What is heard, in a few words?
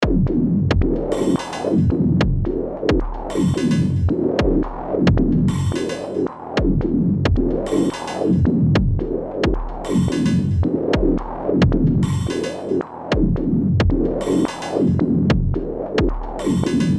loop 110